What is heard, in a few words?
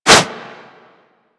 Whoosh, Weapons; Warframe; Furax